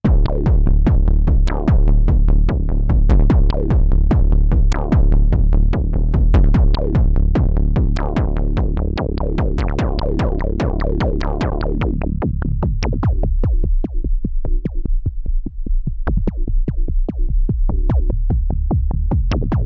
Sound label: DSI Mopho Keyboard Drum Bass